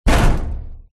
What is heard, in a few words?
impact metal thin large sheet